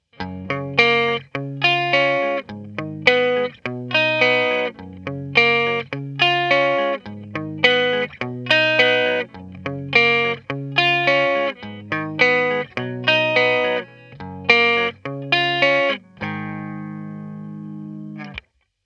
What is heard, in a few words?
E Guitar Picking Dry